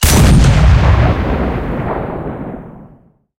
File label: cannon 57mm shot, cannons tanks; zis4